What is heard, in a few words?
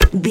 bow crossbow arrow shoot